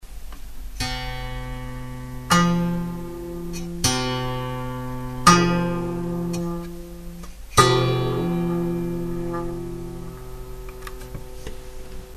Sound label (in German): quarte, musik